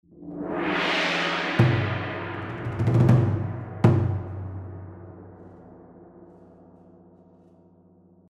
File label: Percussions